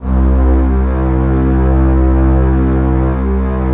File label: U220 string; Greytsounds; Scott Peers Sound Engineering